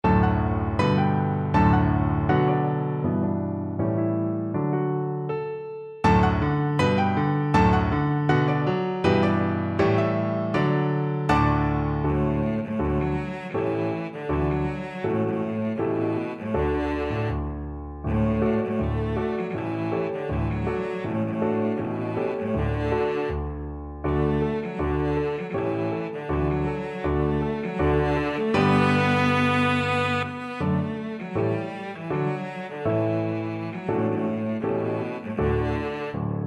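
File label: violoncello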